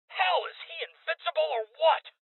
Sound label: Heavy Hit Male